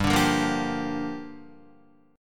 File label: G Minor 7th, Guitar; Gm7 strummed; JGuitar